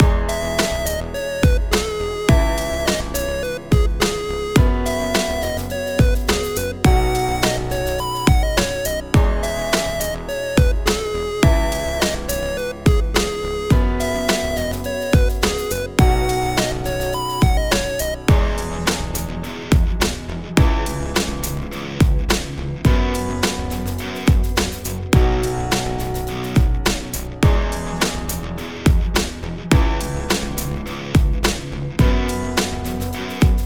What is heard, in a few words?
Game Over Loop; Mash; Friday Night Funkin mod 7quid cancelled build ost windows gamerip 2022